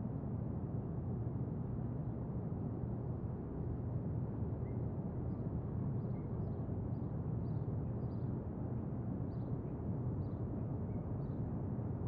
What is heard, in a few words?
room tone; Golden Crayon Sounds